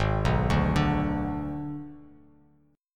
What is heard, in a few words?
Ab Diminished Ab, Bass Standard; Abdim arpeggiated; JGuitar